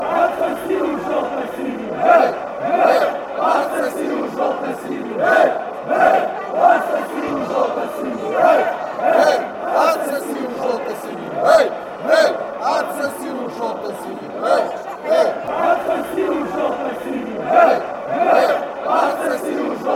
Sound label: chant; metalist